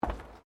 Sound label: FOOTSTEPS WOMENS LOW HEELS ESCALATOR STEP; Footstep Foley; Footsteps (Sound Effects:Human)